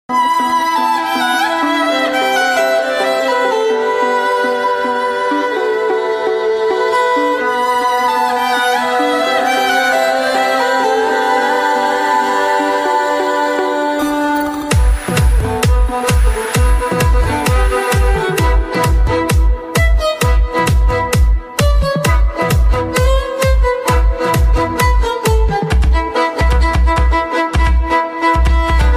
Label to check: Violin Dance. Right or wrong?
Right